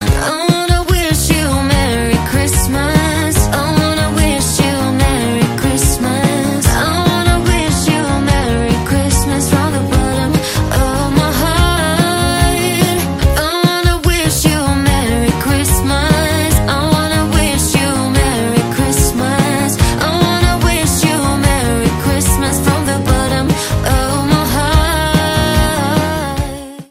Acoustic

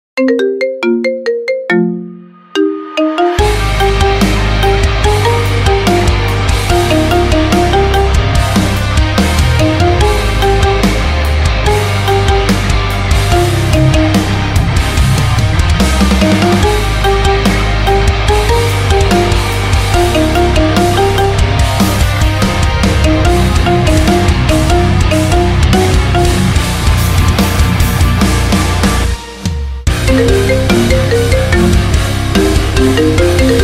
Marimba; Attack on Titan

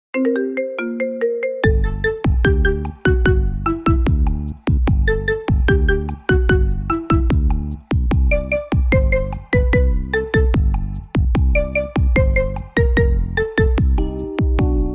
Marimba Remix; Nintendo Wii

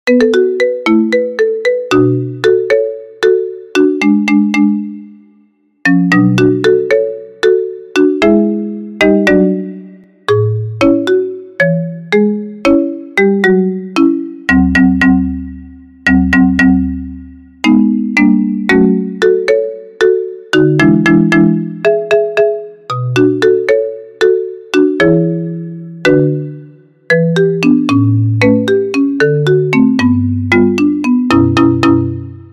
Theme Marimba; Mii Channel

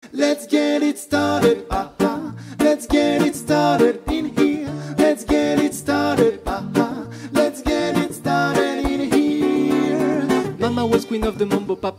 Dub

